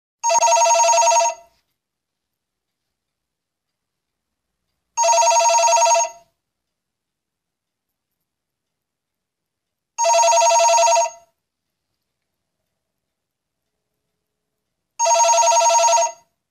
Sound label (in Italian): Telefono Che Squilla